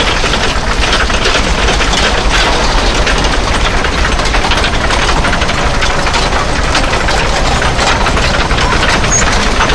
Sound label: tracks heavy speed, tanks; War Thunder